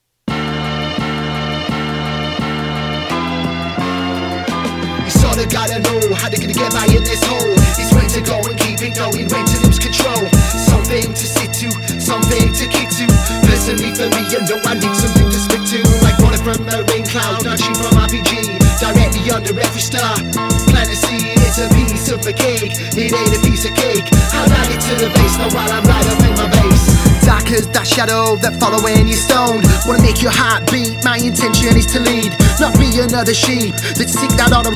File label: Beat Freestyle